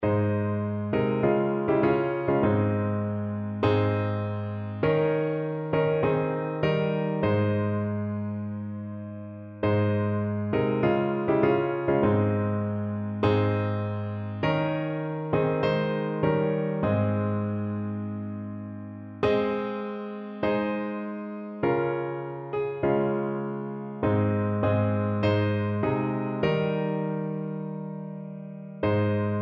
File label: pass me not piano